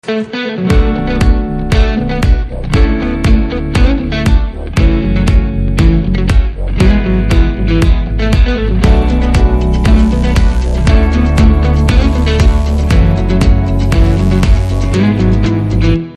BGM Ringtone; WAR-2019 Movie

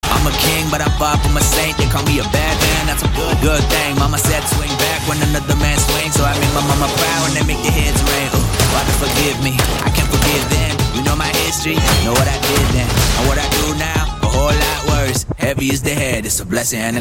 Trailer BGM Ringtone

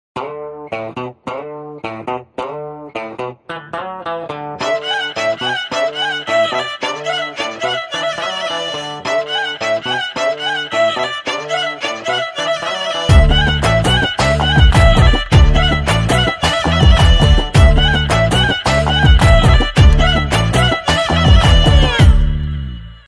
BGM Piano Ringtone; Telugu; from Ravi Teja Khiladi Movie

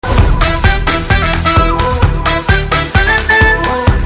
BG Tone; De De Pyaar De Movie

